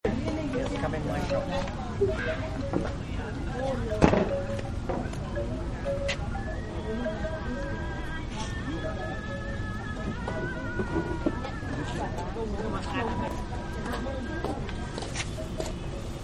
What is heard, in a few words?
Bali Market Amb; Cities; Backgrounds; Bali Market Amb 3; City